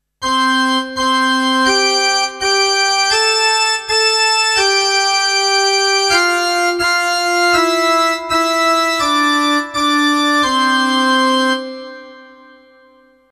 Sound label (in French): orgue